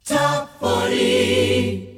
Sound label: jinglepackage; topformat LP jinglepackage 2